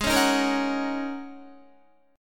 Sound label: Ab 9th Suspended 4th Ab down Guitar; Ab9sus4 strummed; JGuitar